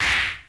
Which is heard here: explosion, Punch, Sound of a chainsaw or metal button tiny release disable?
Punch